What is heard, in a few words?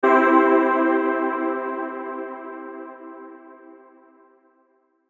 Logo Hit Burst Airy Tonal; Sonic Logo Sound Effects; Production Element Imaging Element Accent Transition